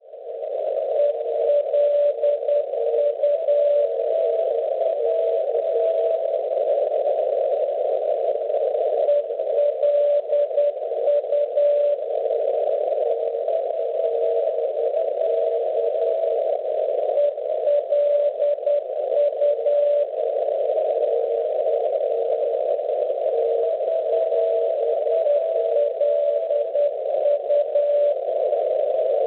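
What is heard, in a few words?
NDB non-directional beacon, 368 kHz; TLB; Tolosa; Francia; Schwarzsee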